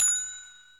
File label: bell Sound